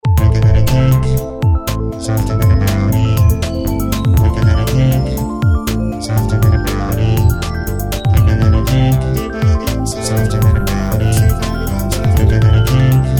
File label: Jingle